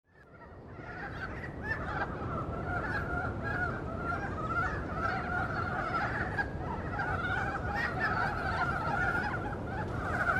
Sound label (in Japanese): ezoaka; sapporo; koe